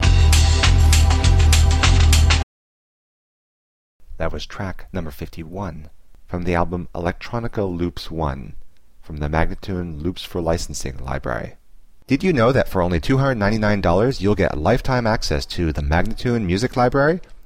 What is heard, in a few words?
099 D spoken; Electronica Loops 1